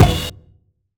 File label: KIT, Dance Mega Drums, HIP